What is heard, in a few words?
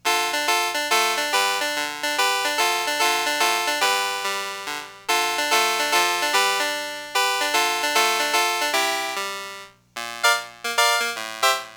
CLAVICHORD, Crumar Orchestrator